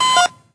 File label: Synth Shots; Vengeance Samples